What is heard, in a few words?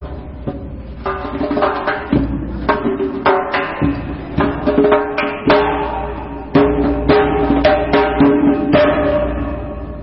Zarb Zoorkhane